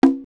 LM-1 BONGO, LinnLM1, perc; drum machine

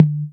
808 Tom, Boss DR660

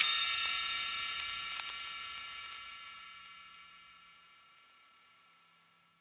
ride; eddyflux

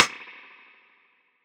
rimshot; eddyflux

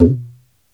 gumki, mrid

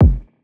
kick; eddyflux; crate bd; dough-samples

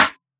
snare; dough-samples; eddyflux

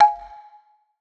block session perc; eddyflux

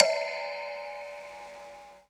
perc; eddyflux

